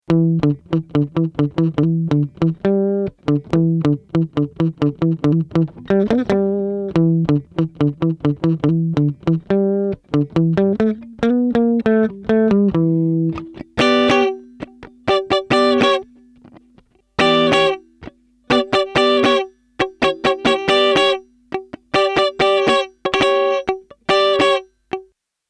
E Git; magnetismus 2; Kobald

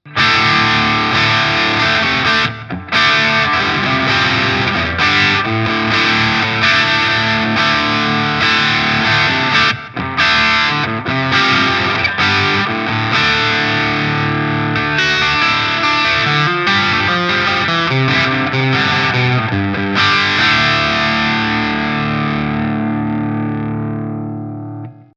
Maybach JazPole 63 Steg verzerrt

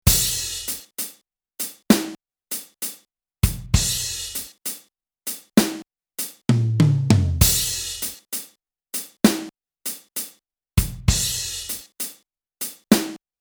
Ballade

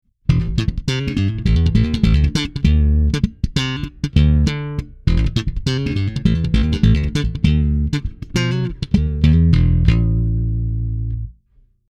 Markbass MB58R CMD 102 Pure Slap Badewanne DI

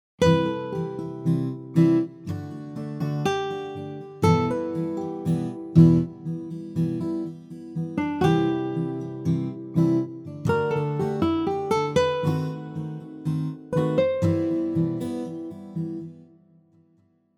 Verschiedene Gitarren; PoeticGuitars 2

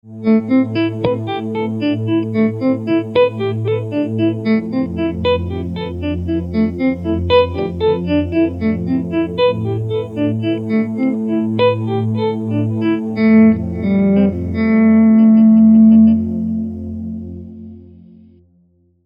Variation A Moll Scale

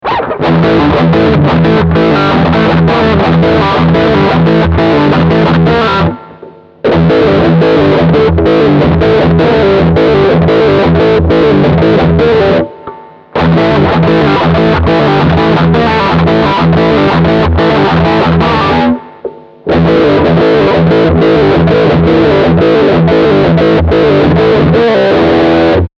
Riff mit Speed Pot On Off

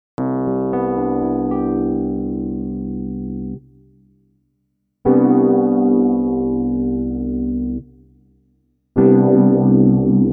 E Piano ohne Effekt Chorus Phaser Rotary Tremolo

